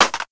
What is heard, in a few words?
glock clip drop, weapons